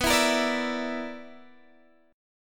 B-Minor Major 7th Double Flat 5th B down Guitar DADGAD